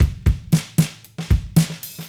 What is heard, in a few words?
Cruisin' Disco Drums, 70s Analog Funk